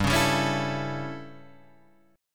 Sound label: A 7th G, chord